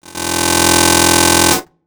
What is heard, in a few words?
Buzz Fill, One Shot Drums